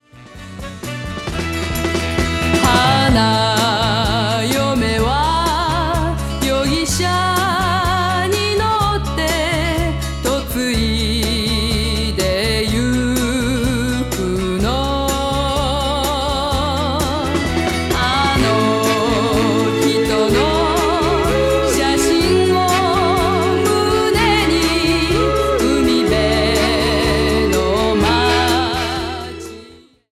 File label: folk japan